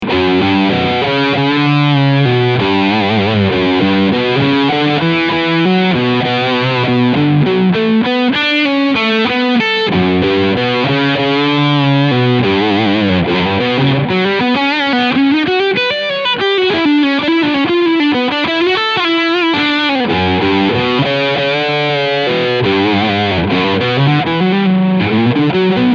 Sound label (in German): Cort G 280 Overdrive Steg Humbucker; Select Amber